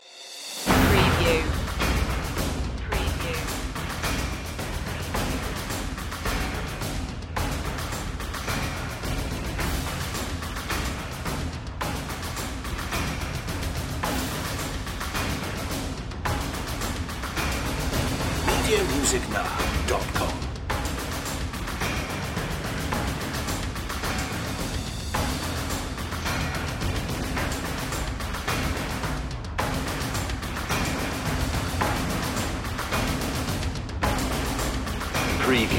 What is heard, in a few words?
percussion only